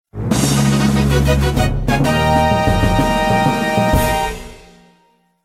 Castle Boss Clear Theme

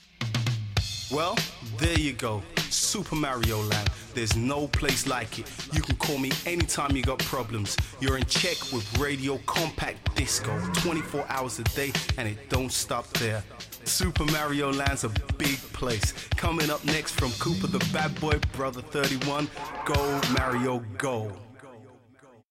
Funk, Radio Compact Disco

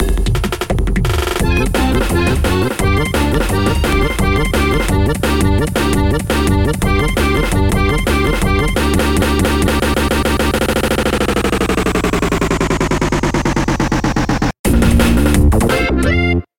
Goat Remix

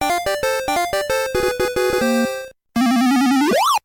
Start music; VS Pinball

